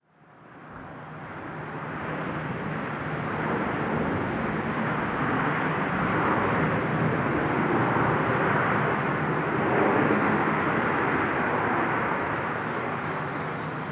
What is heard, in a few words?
airplane, ambient; nuke